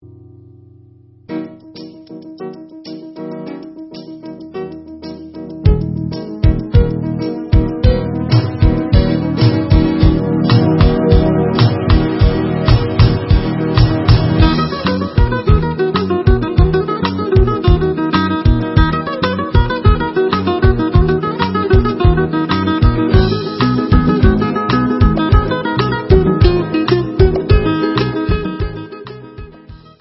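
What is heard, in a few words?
Instrumental, Israeli Dances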